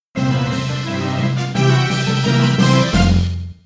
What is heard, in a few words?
Fight Over; Santa's Toy Factory